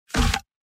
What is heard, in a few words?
put into stash